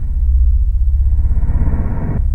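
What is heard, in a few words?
Ambience Sci-fi Energy Loop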